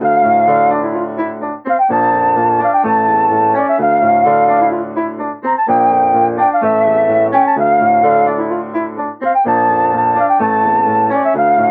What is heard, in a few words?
127 BPM Dm; Tank